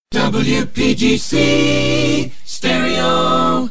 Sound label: jingles image pack remix fm id voice track with stereo